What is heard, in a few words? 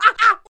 Blue Hit